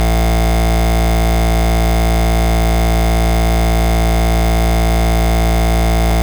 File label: validate emission photodiode